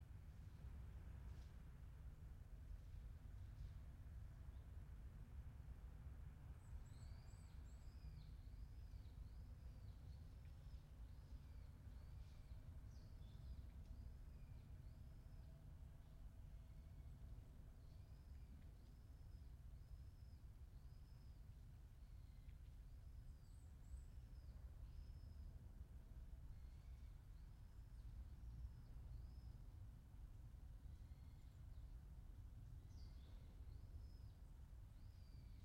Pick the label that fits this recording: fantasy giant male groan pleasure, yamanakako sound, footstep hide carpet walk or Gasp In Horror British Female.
yamanakako sound